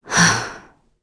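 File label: Vox Sigh, Korean; heroes; Glenwys; kingsraid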